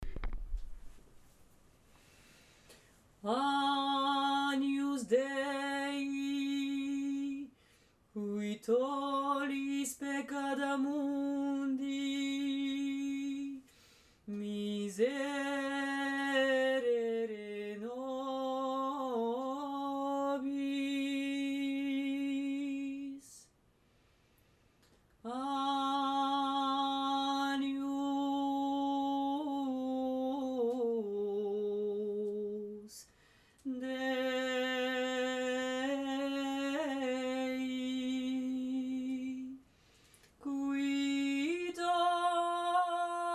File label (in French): intonation, chant; Ateliers chant; agnus dei